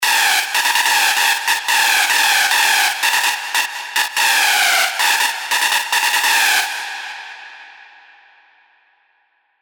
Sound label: SY Screech, sylenth1 hard techno; Lovesick; revealed sylenth1 hard techno vol 1